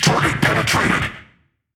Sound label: Hit Confirmation; World Of Warships